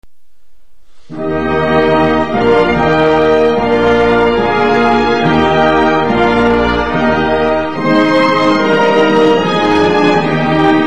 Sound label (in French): Hymne Allemand